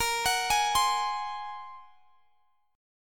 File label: Bb 7th Suspended 2nd Sharp 5th Bb; Bb7sus2#5 arpeggiated; x,x,20,23,21,20